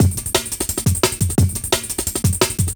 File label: DNB BREAK